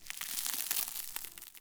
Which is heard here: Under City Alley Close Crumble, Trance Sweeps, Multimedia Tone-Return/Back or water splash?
Under City Alley Close Crumble